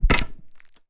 pike attack

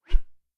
arrow fly

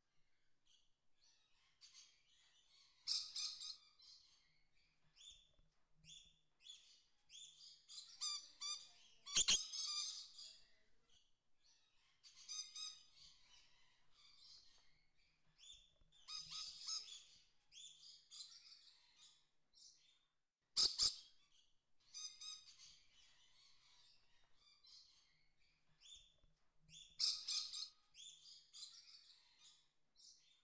day tropical, ambient

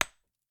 sling stone, attack impact

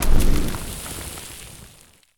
onager hit stone

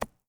arrow dirt, attack impact

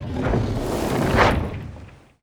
ram attack

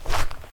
fs gravel, singlesteps

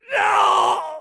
male death

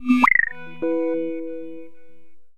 alarm objectives; interface

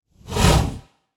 horse idle; fauna

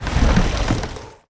ship select

